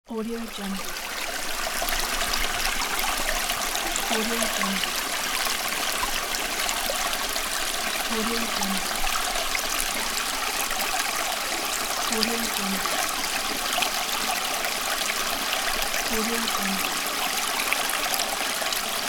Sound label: water stream flowing